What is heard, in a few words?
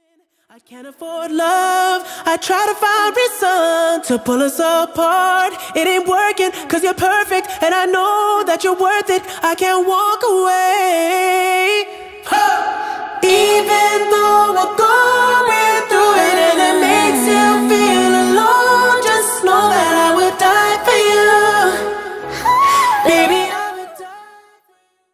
Studio Acapella